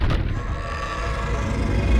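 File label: Music Volcano 120; from dust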